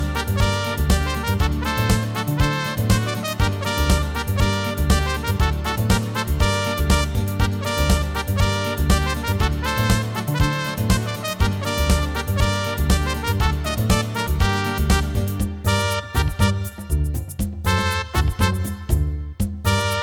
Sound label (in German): Spanische Melodie; Der Rhythmus-Globetrotter